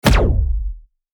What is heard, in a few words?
weapon; Default Charge Rifle